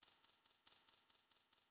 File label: beat